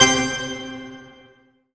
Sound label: HIT C4; GREEK; Q Up Arts; Dream Experience